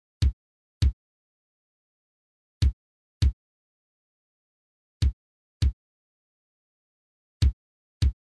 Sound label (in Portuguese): Toque - Bumbo